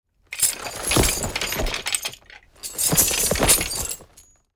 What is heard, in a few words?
Medieval armor and leather uniform grabbing